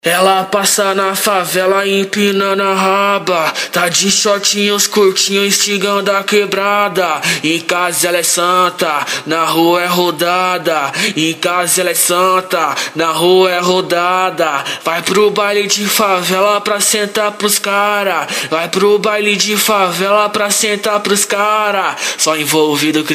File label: Acapella